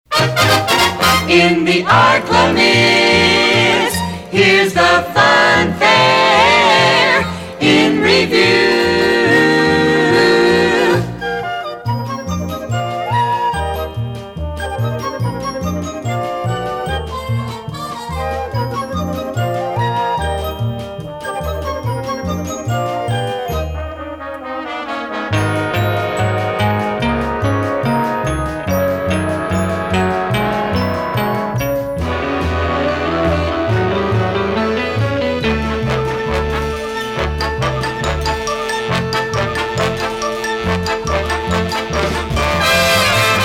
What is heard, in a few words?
Fun Fare; KNOE